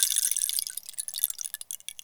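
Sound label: RAINSTICK, ambience; AKAI S6000 CD-ROM; WorldSounds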